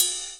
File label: Ride; Equipped Music; Loungin House